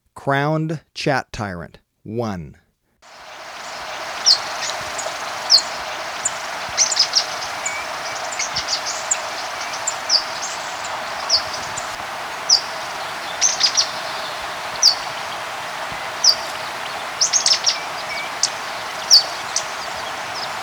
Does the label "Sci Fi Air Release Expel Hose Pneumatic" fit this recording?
No